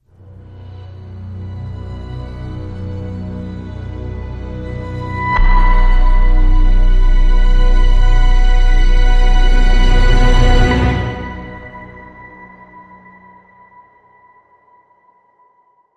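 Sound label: Dramatic Sting, No Percussion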